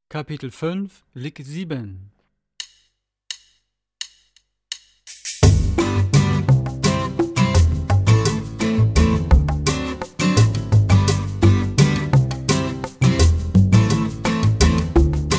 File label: Latin Style Guitar